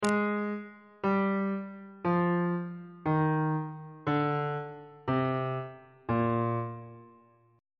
a sharp mixolydian mode descending

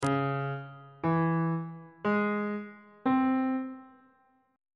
d flat minor major 7th chord on bass clef

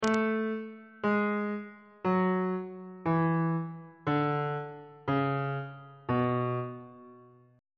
c flat dorian mode descending

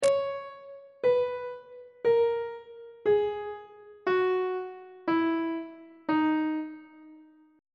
e flat phrygian mode descending; on treble clef

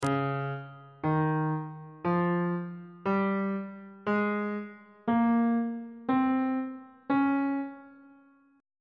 d flat lydian mode note positions

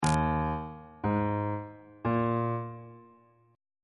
e flat suspended 4th triad chord intervals

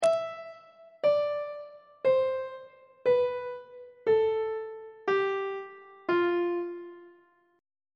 lydian mode descending on treble clef

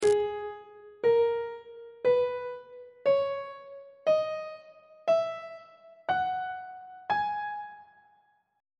g sharp minor key signature on treble clef

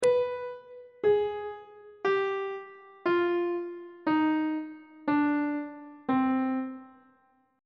d double flat harmonic minor scale descending; treble clef

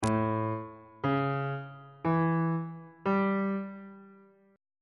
d ionian 7th chord 5 is a dominant 7th

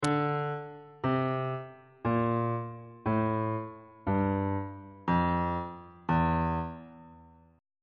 f flat locrian mode descending on bass clef

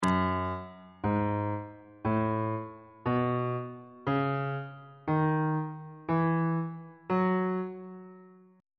f sharp dorian mode on bass clef

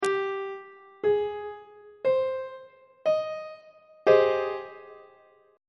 g sharp major 7th 3rd inversion on treble clef

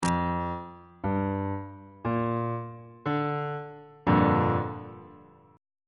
a sharp major 6th 2nd inversion

